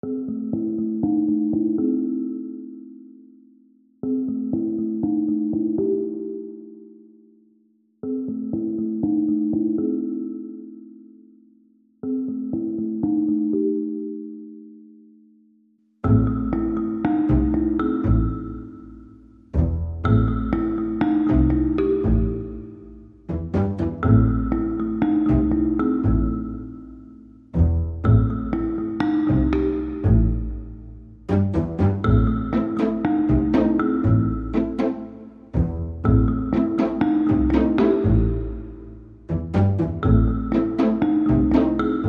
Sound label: No Percussion Reduced Arrangement